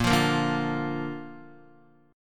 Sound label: Bb Major Bb down Guitar; strummed; JGuitar